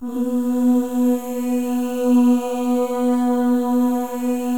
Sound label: MOVING V1, Symphony of Voices; Spectrasonics